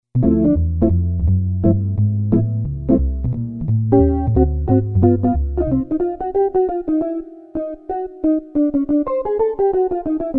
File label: Jazz Guitar, Casio CSM-1; Tones